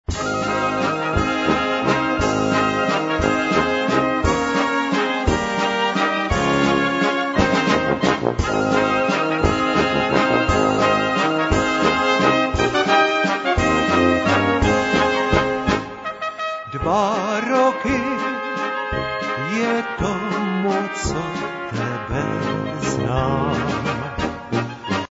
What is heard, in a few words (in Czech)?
VALCIK